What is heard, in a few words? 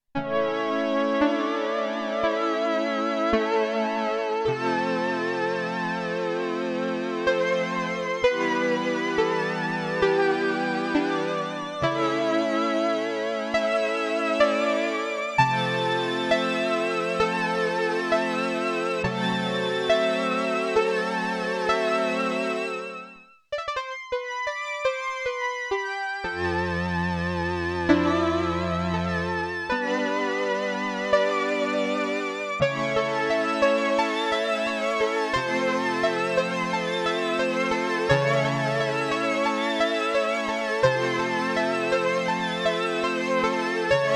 ENSEMBLE & PIANO; SIEL DK 80; Factory